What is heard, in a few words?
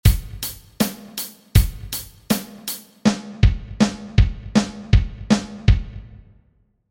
fill